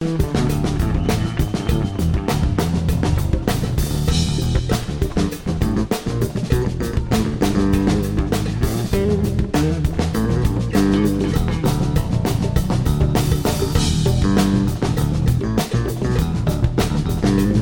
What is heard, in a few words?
Drums and Bass